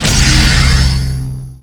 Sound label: added effect to prism shot for presence; PRISMRAY; tesla prism sounds